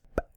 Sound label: Bubble